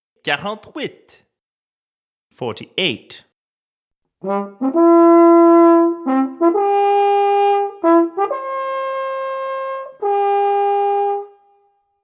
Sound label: French Horn